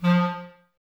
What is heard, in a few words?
Woodwind Brass Ensemble; Sample CDs; Miroslav Vitous; Wood Brass 2